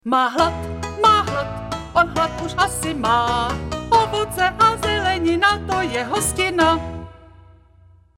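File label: Female Czech sing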